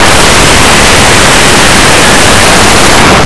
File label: frog; Blue Heat